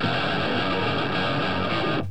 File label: heavy distortion guitar